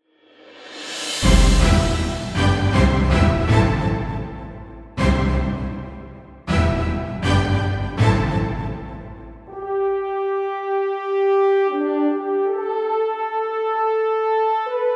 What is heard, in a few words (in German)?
Orchester Tusch mit Hornsignal; Orchestra Tusch Hornsignal (0:26